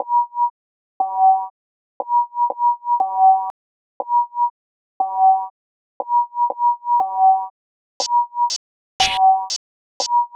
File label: Sine Wave